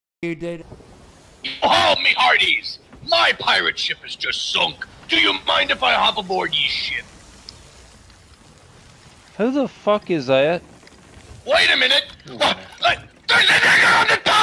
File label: chant